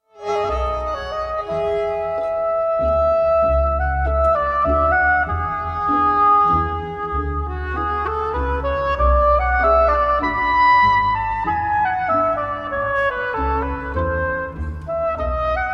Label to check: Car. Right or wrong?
Wrong